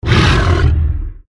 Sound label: black bear attack voice bottom